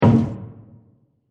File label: Wood Hit; Blast Sound Effects; Blasts (Sound Effects:Impacts)